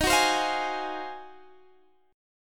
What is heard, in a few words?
Ab 13th Eb